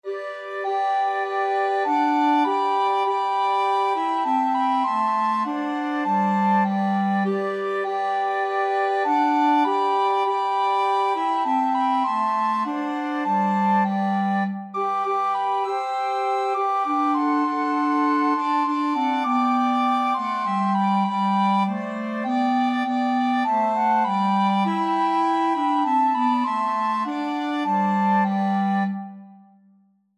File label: flexible mixed ensemble, 4 players